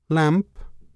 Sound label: lamp; CD Action 18b 1997